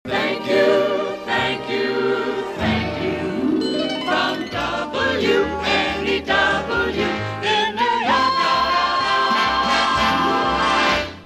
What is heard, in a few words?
Thank You jingle